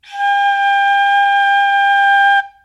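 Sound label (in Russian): flute single long bright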